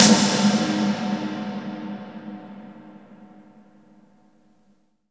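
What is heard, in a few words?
snare processed, Disco House; USB Soundscan vol.20; Fresh Disco House I; AKAI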